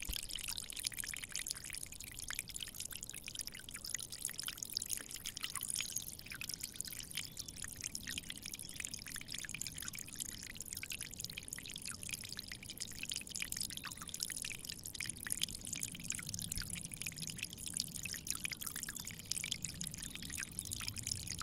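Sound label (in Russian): просто вода падает вниз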